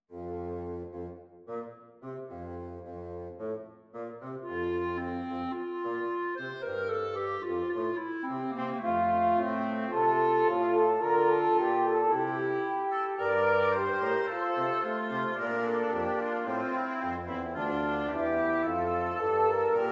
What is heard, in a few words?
small wind quintet